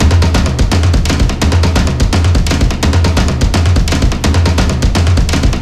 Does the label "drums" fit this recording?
Yes